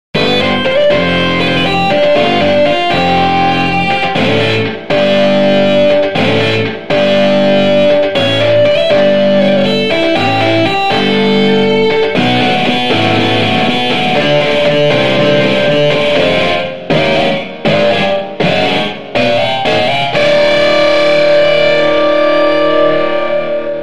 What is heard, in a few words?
beat; E.gtr Solo